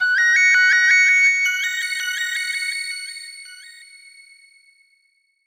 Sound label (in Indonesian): Nada Dering Nokia Jadul Do Mi So